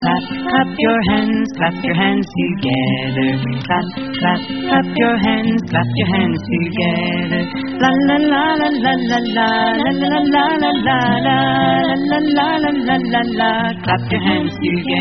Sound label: Clap, Clap, Clap Your Hands